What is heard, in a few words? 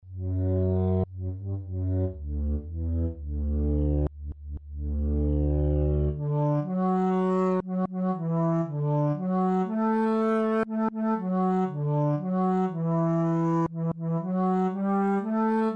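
waltz, Dulcimer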